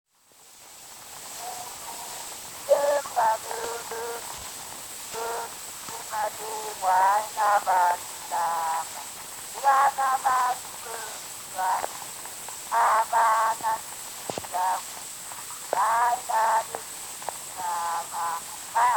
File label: Zulu Recordings; Father Franz Mayr; Song